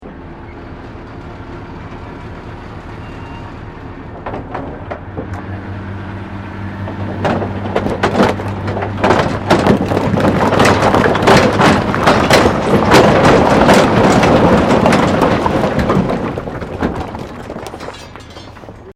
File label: DUMP TRUCK EMPTYING ROCKS; Construction Vehicles